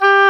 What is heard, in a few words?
oboe, Roland